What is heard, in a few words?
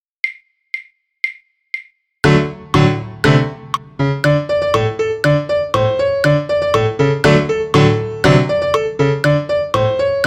polka piano click